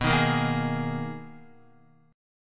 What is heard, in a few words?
B Major 13th B down Guitar; strummed